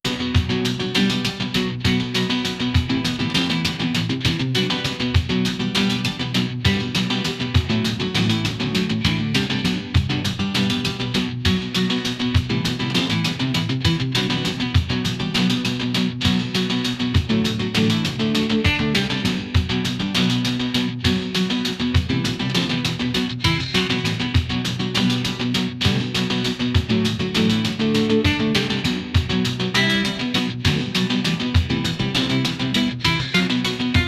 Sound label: a funk guitar base; funk bit